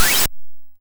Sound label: Snare, YM, CHIPSHOP HITS DRUMS; ChipShop Deluxe